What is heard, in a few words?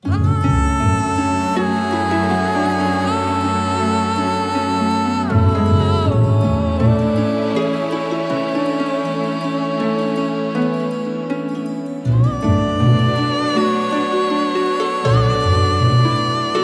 Game Menu BPM80 Gbm Voice